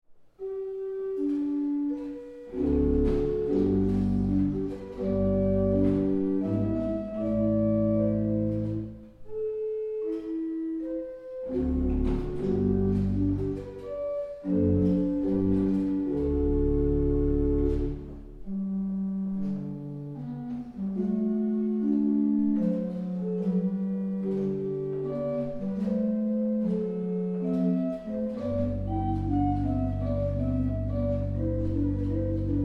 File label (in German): vorspiel G-Dur, orgeln; dietingen